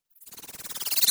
Laser effector

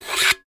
Can friction

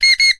Whistle 2 up

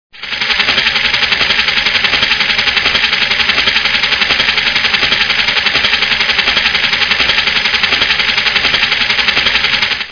sewing

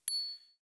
Crystal

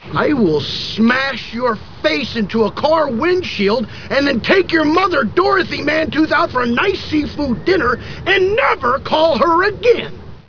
windshield; Anchorman

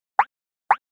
Boink double high

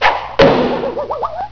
arrow

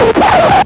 screech

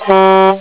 truck horn

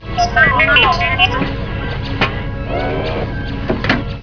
hydraulics; star wars

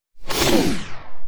Wind down vinyl

